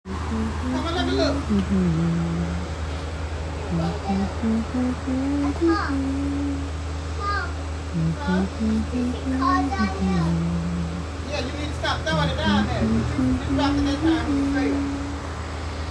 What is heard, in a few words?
Hum